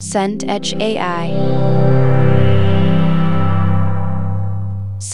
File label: synth pad warm